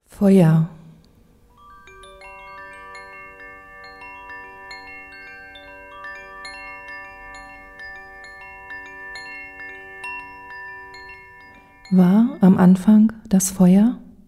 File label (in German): feuer